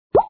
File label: Water Drop; snowline